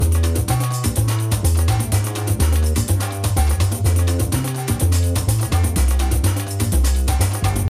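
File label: food world music paradise fight rythm x bass; rayman origins 2011 gamerip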